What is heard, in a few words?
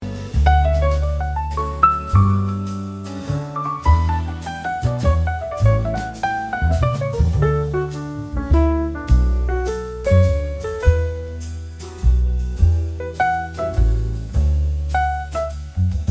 jazz music